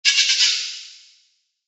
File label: evil laughter real; Halloween